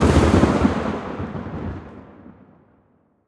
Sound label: Lightning; Prison Architect